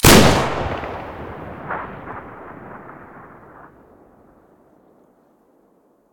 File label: bm16 short hole stereo, weapons